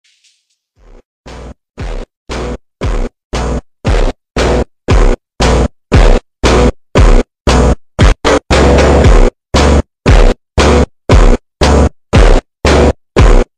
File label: super slowed